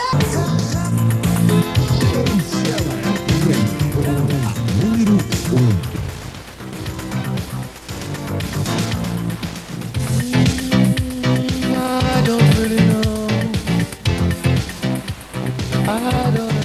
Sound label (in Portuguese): bloco musical; Cancela FM Ituiutaba MG